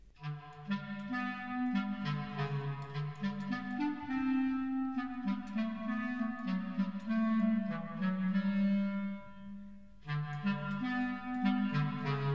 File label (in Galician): GATO